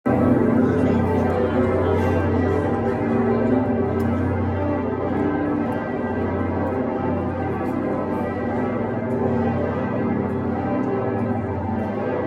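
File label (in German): ausschwingen vollgeläut kölner dom, glockenkunde; Am Morgen des Festtages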